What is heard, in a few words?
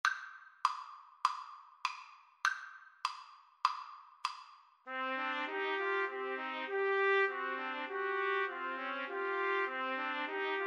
mountain king, Trumpet Trio